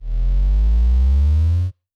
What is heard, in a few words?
Lift Off FX, 8-Bit; 8-Bit Kit Volume1